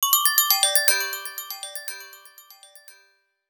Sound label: Light Reminder; Sony Ericsson; Xperia X1; PlusFourSix; Venus; Lars Edvall